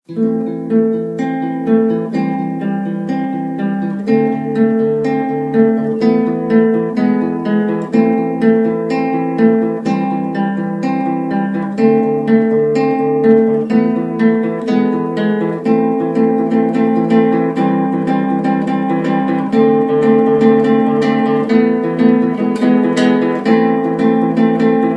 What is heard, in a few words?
guitar; STALKER